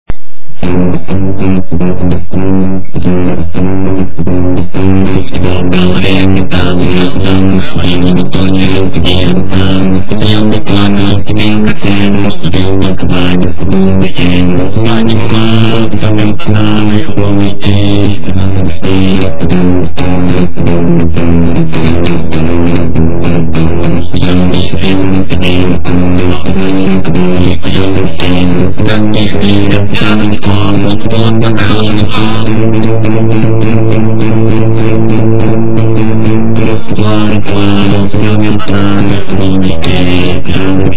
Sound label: jazz